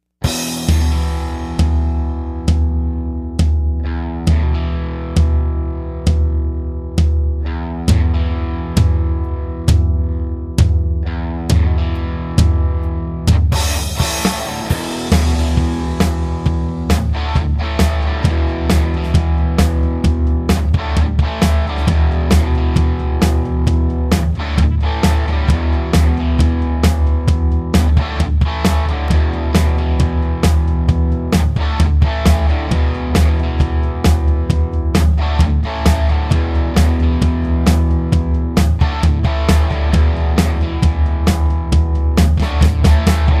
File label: rocker, backing tracks